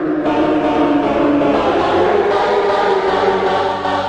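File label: oldskool synthline, 117 bpm; SYNTH AND ELECTRONIC LOOPS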